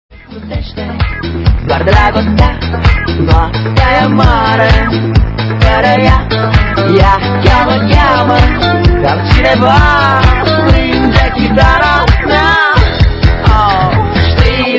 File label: disco dj